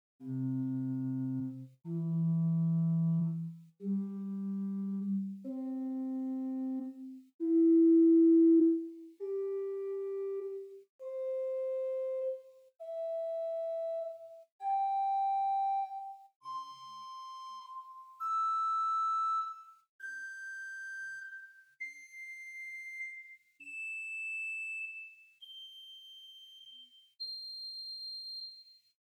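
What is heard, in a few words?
Great Spitzfloete 4 arpeggio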